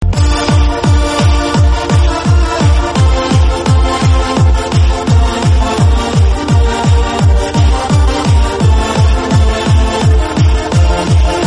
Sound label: bass xtra